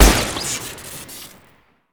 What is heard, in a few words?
ump45 shoot crit, weapons; tf2classic